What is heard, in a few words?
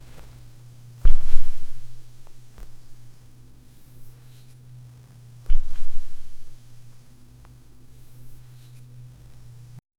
soft fabric piece gently, textile